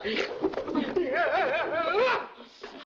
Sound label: spin